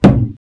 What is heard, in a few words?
met strk, bugs; Starship Troopers